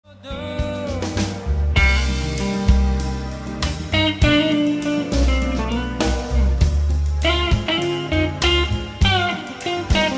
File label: jazz sample solo